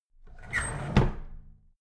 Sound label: drawer big squeak close heavy